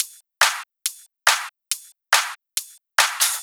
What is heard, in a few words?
Loops 140 BPM Hihat Percussion Loops